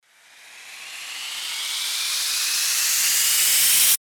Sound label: RISER